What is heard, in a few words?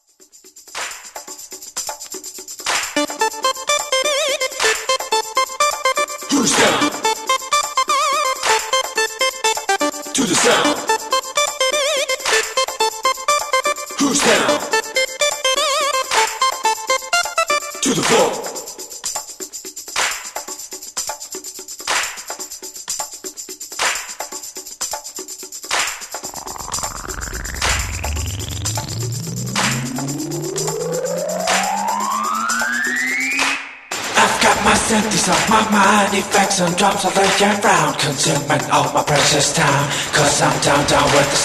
acapella